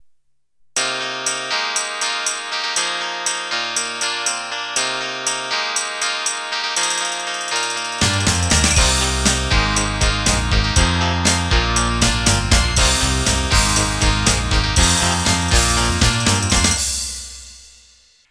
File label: Pop Rock Intro